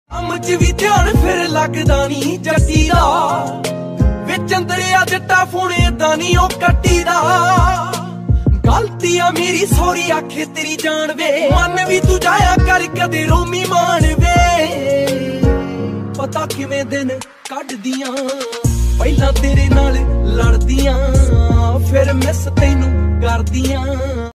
Dance Punjabi Ringtone

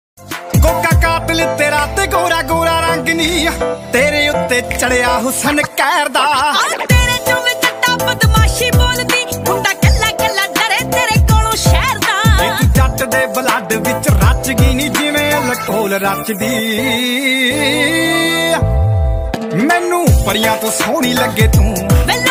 DJ Punjabi Ringtone

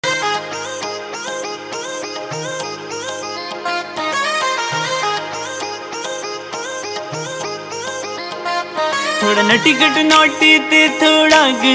Punjabi Ringtone

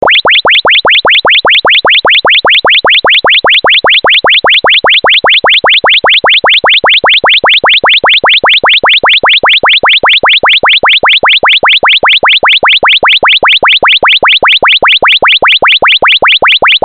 Warning Alarm

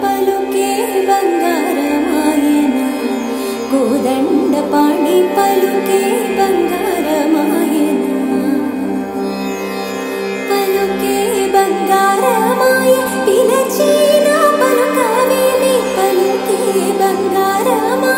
Telugu Ringtone

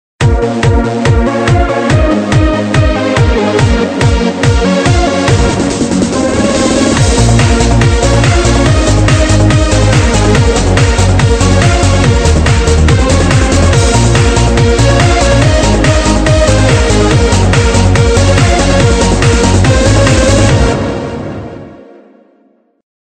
Dance Ringtone

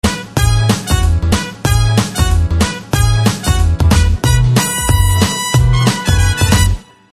BGM Telugu Ringtone; Samantha; Oh Baby

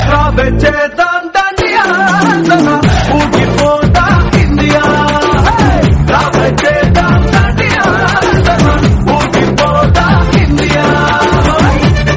Telugu Ringtone; Oosaravelli

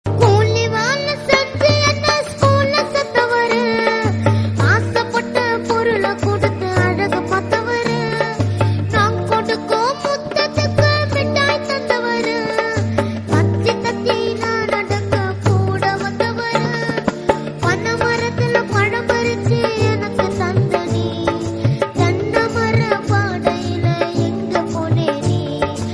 Song Sentimental Tamil Ringtone

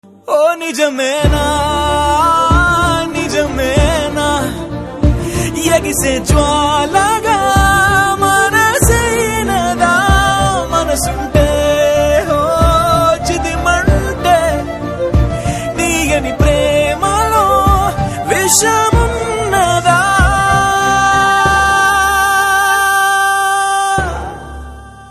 Telugu Ringtone

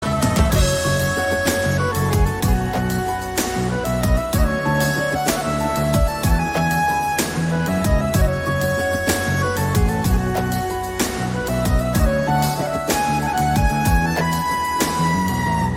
BGM Telugu Ringtone

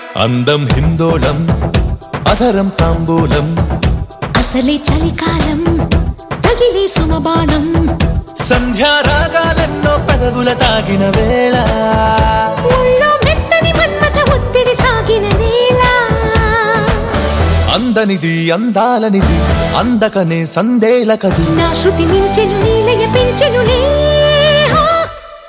Remix Telugu Ringtone

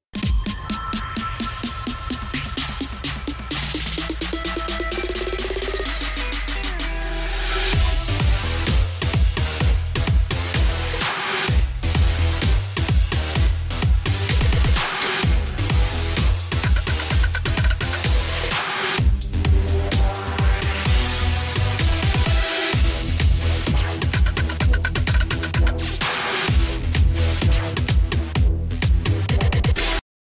Drop The Bass Ringtone; Fortnite

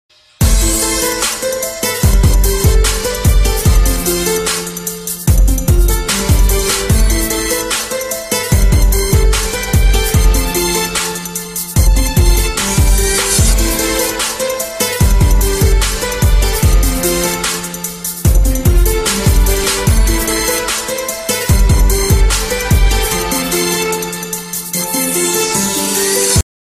Sad Beats Ringtone

